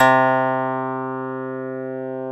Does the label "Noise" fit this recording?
No